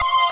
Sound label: synth